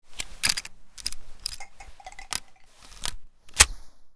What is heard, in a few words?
winchester reload, Double Barrel Shotgun; zps; Weapons; Firearms